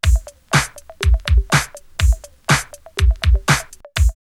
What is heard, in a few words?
Solid Beat 122, drumloops OldSchool; X-Static Goldmine